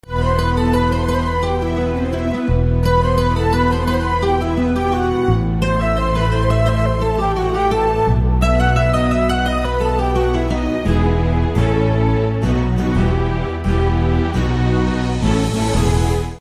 piano bgm ringtone; tamil ringtones; psycho

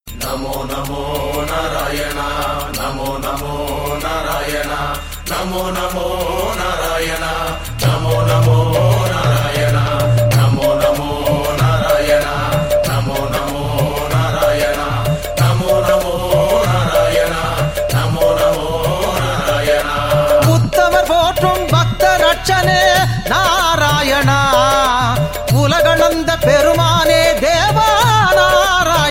namo namo narayana, devotional hindu; shivan

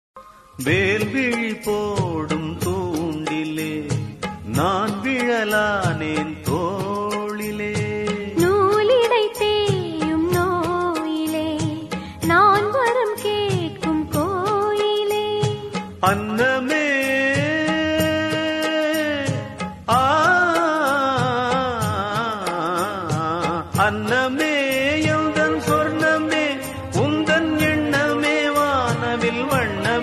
melody ringtone

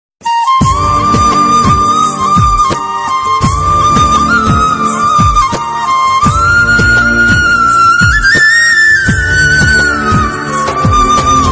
tamil music bgm; samurai

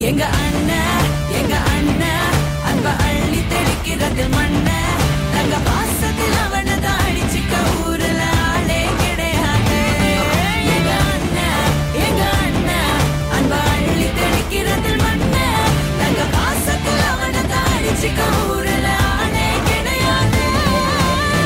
bgm ringtone; tamil; namma veetu pillai

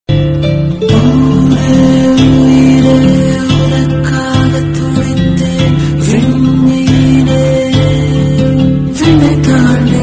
bgm background music

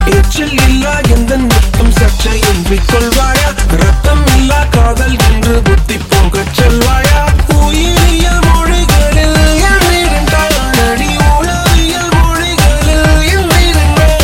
sms tone

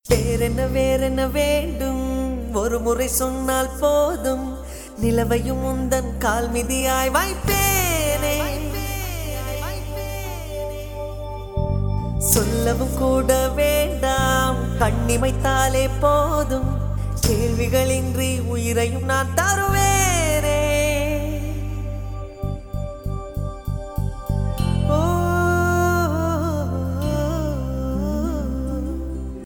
male

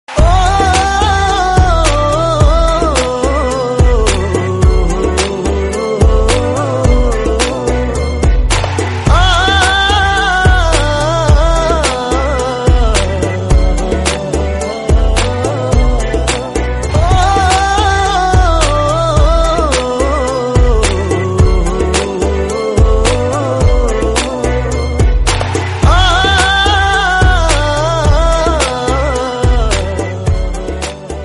background music

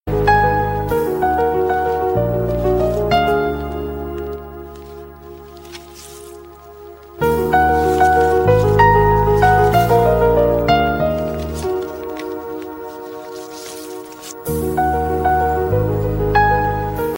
piano bgm; kadalar dinam

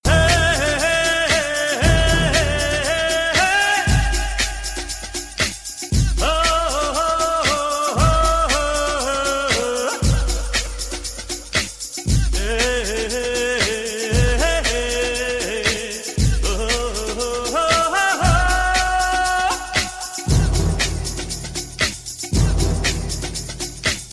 humm; oruvan

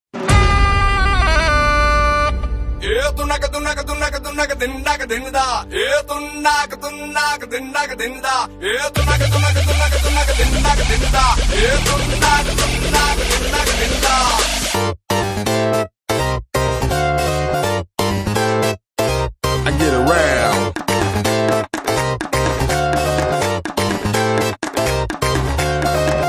bgm ringtone; tamil; viswasam

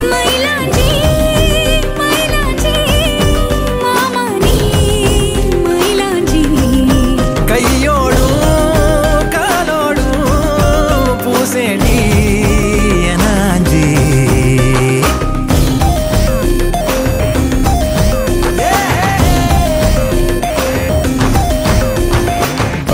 bgm ringtone; tamil; namma veetu pillai

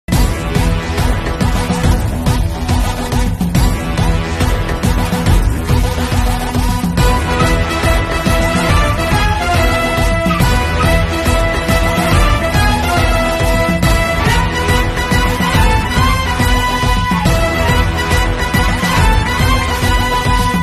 bgm ringtone

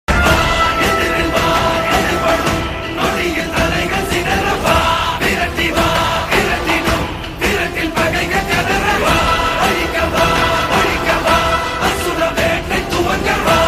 blood bath bgm; tamil; asuran

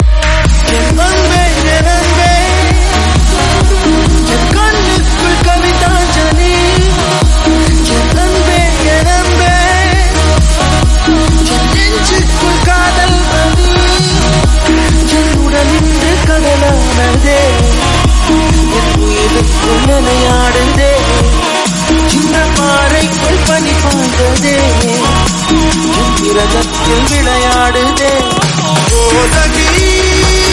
remix ringtone

